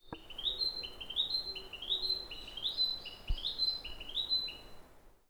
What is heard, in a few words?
kibitaki, bird song; キビタキ; さえずり①; 新潟県東蒲原郡阿賀町川口 森林; J-eco 鳥のさえずり図鑑